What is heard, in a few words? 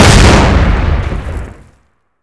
explode; svencoop; echoes